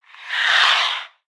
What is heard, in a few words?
Ice Oneshot; Spinjitsu